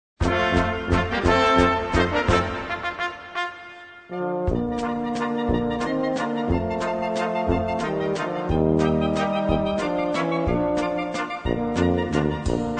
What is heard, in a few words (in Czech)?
VALCIK